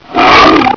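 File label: attack growl, bullchicken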